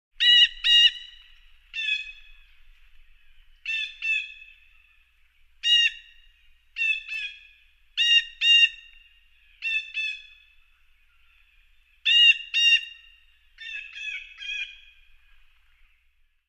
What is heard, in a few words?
Bald Eagle call of nature